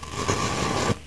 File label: push box; debris; aomdc